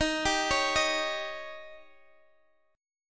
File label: Eb 7th